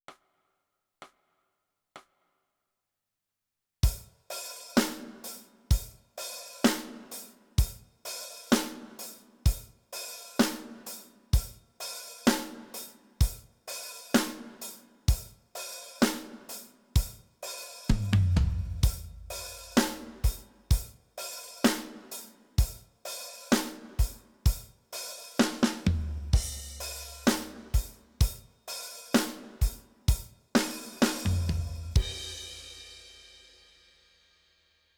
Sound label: Drums